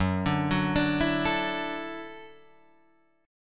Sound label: Gb Minor 7th Sharp 5th, Guitar DADGAD